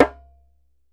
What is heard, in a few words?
DJEMBE, African Drum